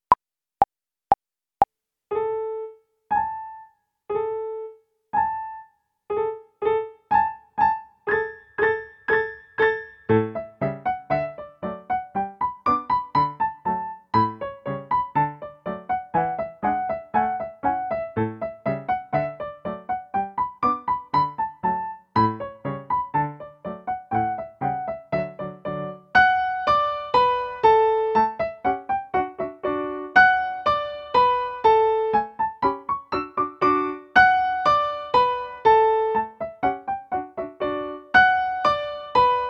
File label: Beginner 120 BPM, violin